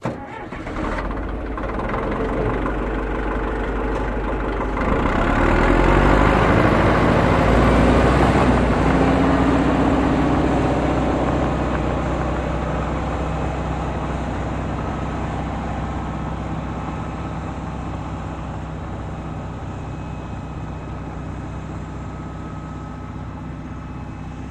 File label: Bulldozer On, Run, Fade Away